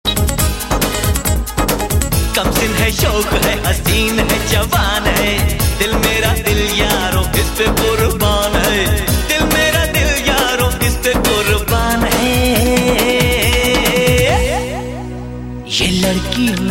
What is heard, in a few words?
Hindi Sweet Ringtone